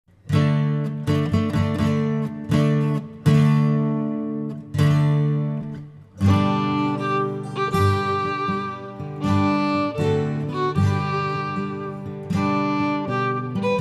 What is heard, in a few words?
wedding march violin; violinduo